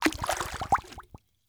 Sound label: bota bag fill; Rust